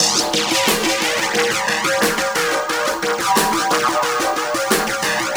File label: Ripple Perc